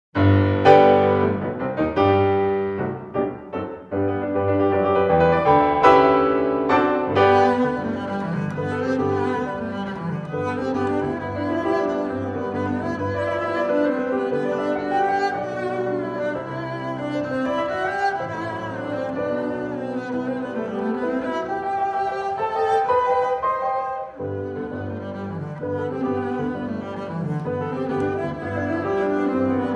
Sonata for Cello and Piano - Allegro mosso- Moderato- Vivace